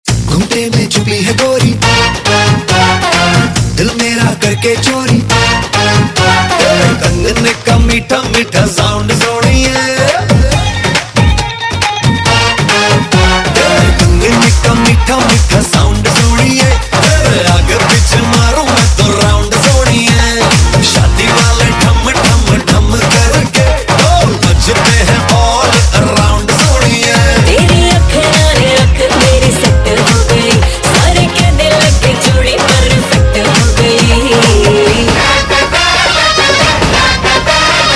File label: Ringtone, Bollywood; Welcome Back